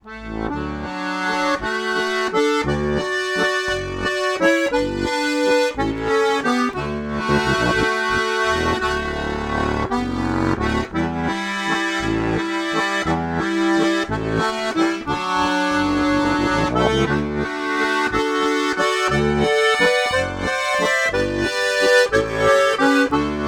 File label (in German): ADGC halboffen, harmonika